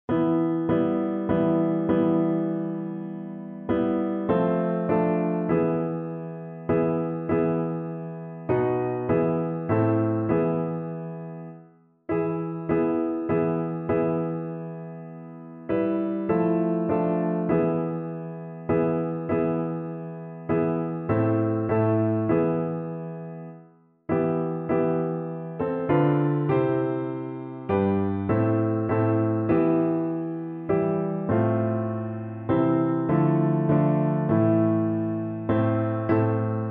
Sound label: tallis theme, piano